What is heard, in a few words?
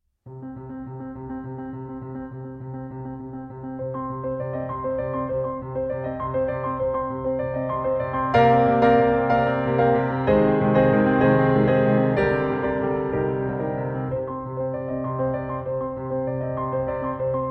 Études For Solo Piano